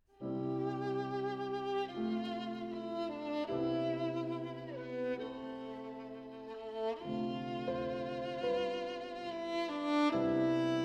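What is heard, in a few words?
Meditation, violin and piano; Bridge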